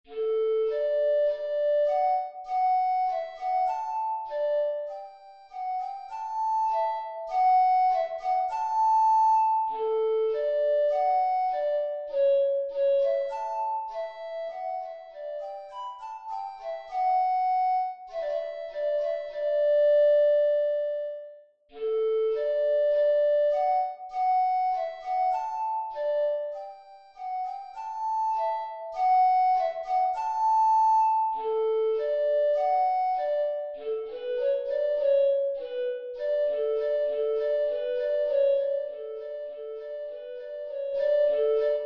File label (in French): andante en re majeur; lewis alastair